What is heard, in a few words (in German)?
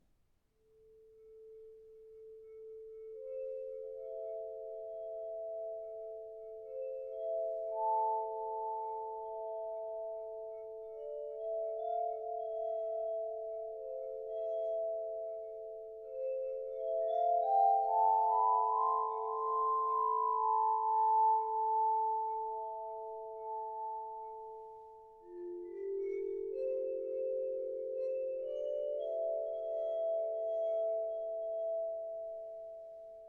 Glasroehrenspiel